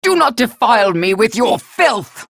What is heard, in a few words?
pain ability damage; galena; unholy paladin; low health